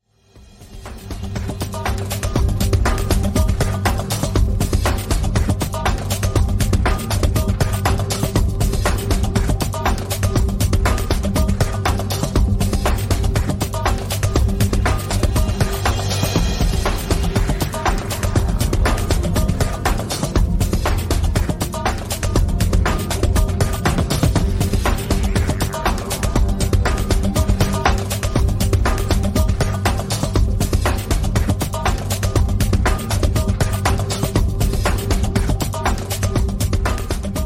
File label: Ecstatic Dance Relax